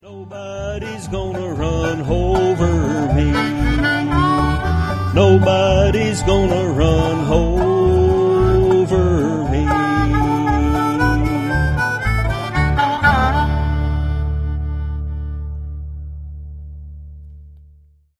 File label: Ballad